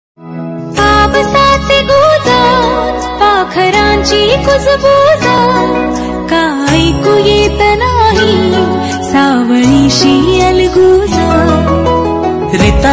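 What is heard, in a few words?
love ringtone, marathi ringtone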